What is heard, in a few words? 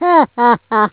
hehehe